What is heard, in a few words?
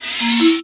chat recv; gabber ooochat; BeOS